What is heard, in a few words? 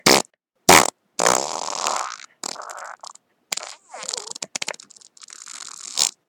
long wet fart